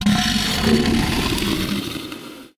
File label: carno voc mumble